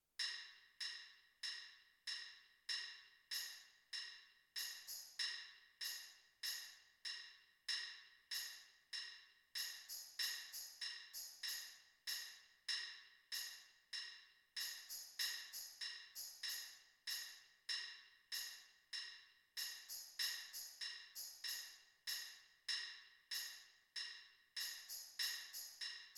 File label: tambourine